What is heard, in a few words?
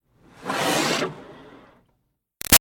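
Water Spray Interior Car Grill Med; Car Wash; BigRoomSound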